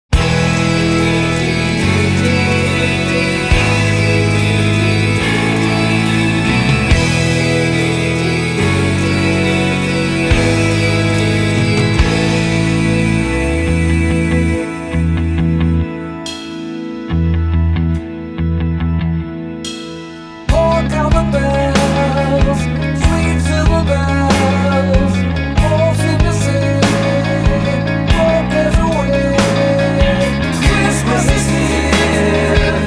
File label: carol Bells